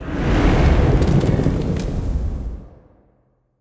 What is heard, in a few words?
end Bonus